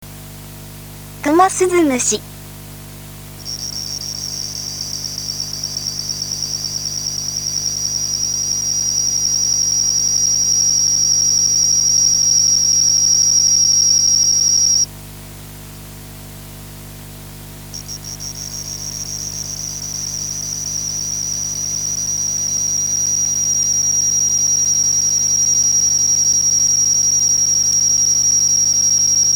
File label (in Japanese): zukan kumasuzumushi